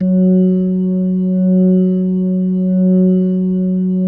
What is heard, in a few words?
F#3S, B3, Vintage Keyboards